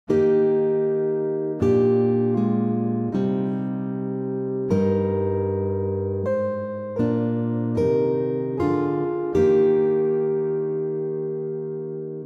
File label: Guitars Choir Tuba